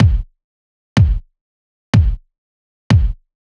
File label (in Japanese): 遅いアタック